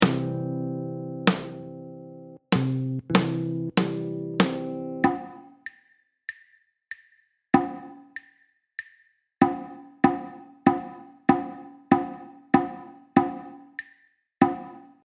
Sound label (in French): rythme, guitare jazz